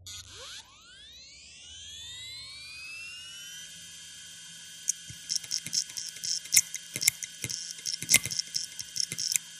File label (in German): Defekte SAS Festplatte